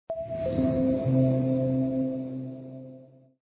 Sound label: spin Morf Sound; fortune Wheel